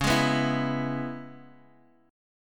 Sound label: C sharp Minor 7th, Guitar, Open D; x,4,6,5,7,x